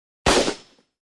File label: M-14 Automatic Rifle; Single Shot; Single Shot With Clear Distinctive Bolt Action.